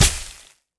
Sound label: Sting Attack